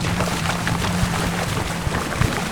Auto Tires Mud Loop; Automobiles

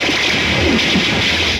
Static; Blastwave

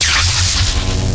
Electricity Spark Activate; Energy & Forcefields

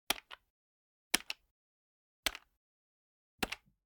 Generic Button Plastic Tiny

